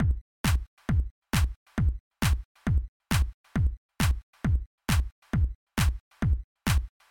Basic Techno 135 bpm

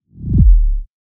BD6 FX

